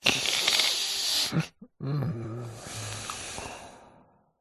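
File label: human male asleep breathing interruption nasal groan; zapsplat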